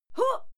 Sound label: female fight scream huh short